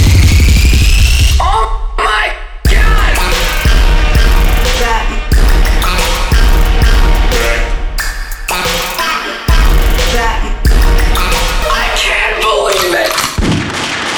MVP Jingle; H.I.C.H.

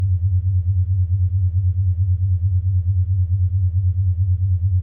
Magnetic Throb Portal

